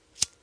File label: igniter lit